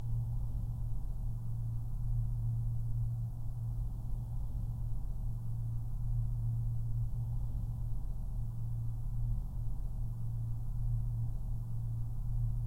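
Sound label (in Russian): еле слышный шум работы телевизора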